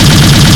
Mach Gun Loop

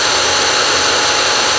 decomp loop; Star Wars Knights Of The Old Republic I; XBOX

